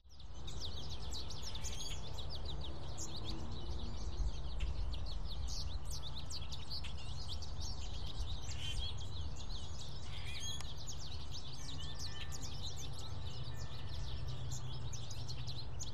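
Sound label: American Goldfinch; Fort Collins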